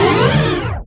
DANCE FX

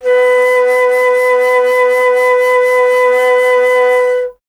Alto Flute, Solo Instruments

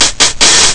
hihat play; ST-XX Amiga Soundtracker Sample Packs

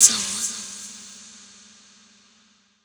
Fx Vox; Equipped Music; Loungin House

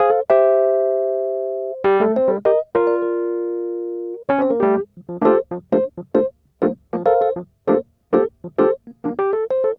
Smooth Groove Rhodes, 70s Analog Funk

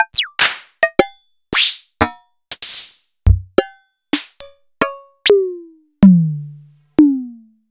Sony DPS-F7 Kit

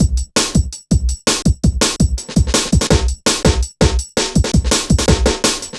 Programmed Stepz, Jungle Drum Loops

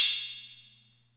Music Percussion Computer HH open, Drum Machines; Atlantex MPC-1; HHopen16

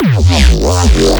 Neuro Bass

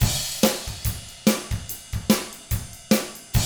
ROCK, Double Platinum Drums

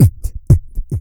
HICCUP, Vocal; Blues & Soul; EURO HICCUP

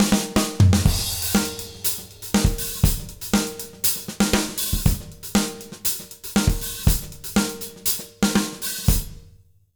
120 JROCK, Drumloops

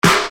Percussion, EuroDance

